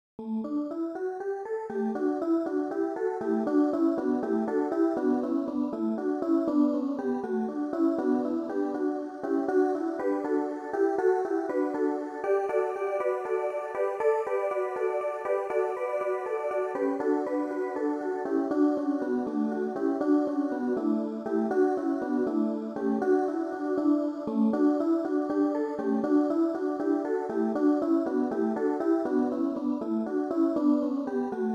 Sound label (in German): Text und Musik Playback